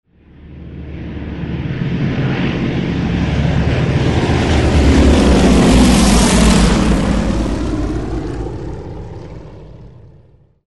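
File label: yak beech passage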